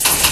Lift Loop Start

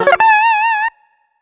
Scare

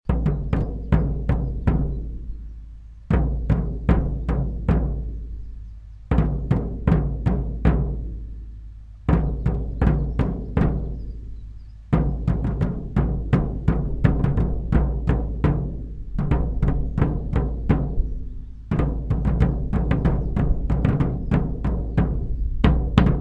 Drums Civil War March; ultimate general gettysburg gamerip 2014

